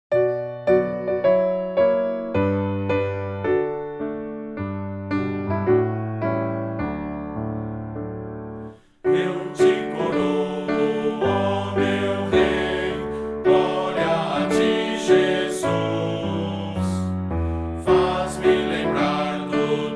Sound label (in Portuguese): voz piano